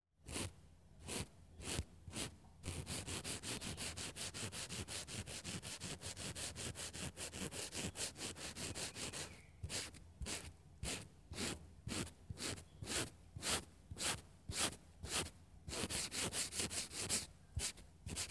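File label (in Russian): полированной наждачной бумаги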